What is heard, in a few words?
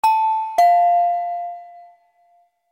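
door chime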